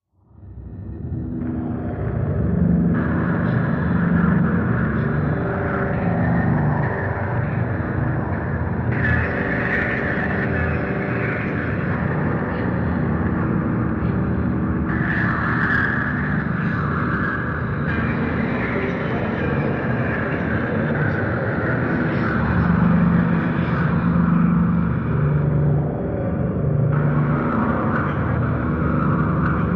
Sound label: Ark Speak Metallic Dist, eerie ambience